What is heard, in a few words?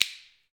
PRC, Snaps; Roland L-CDX-01; Clap & Snap